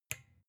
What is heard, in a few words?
desk lamp off soft